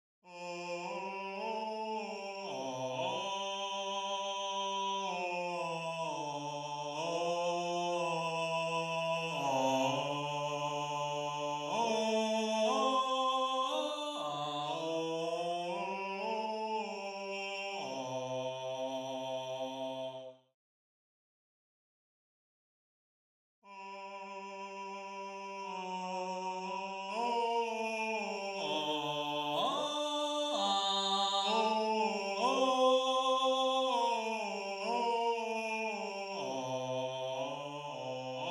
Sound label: Bass